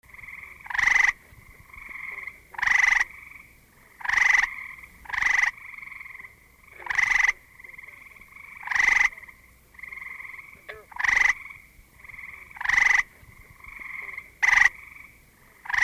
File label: atmo frogs eastern grey tree frog